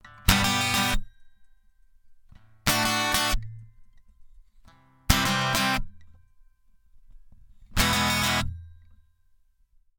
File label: WA-2A Piezo Western Compress